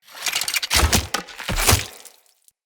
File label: Bow Finisher Front; Sinecure